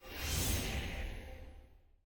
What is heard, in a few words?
loot disenchant outro